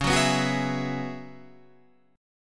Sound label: F sharp 7th Suspended 2nd Suspended 4th, C sharp